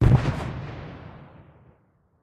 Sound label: tank artillery fire dist; Original TK Sounds